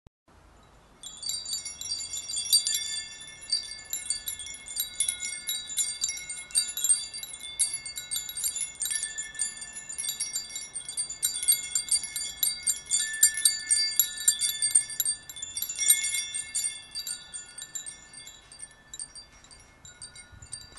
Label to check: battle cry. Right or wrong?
Wrong